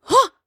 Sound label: Cloth Combat Jump